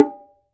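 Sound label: low bongo open; Cuba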